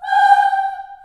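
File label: G4 Choir Children Ohs, Choir Acoustic & Synth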